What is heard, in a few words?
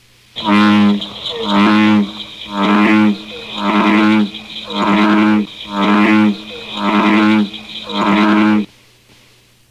bullfrog